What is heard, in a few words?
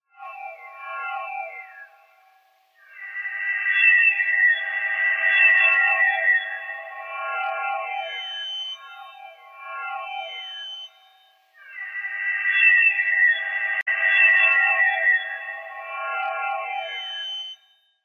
NOISE